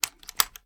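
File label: foley reload loop load; weapons; gmod; viper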